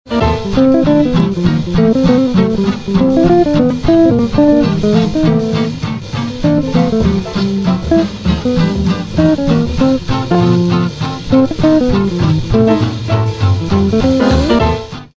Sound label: blues